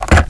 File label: Flip Transition Down; THUG2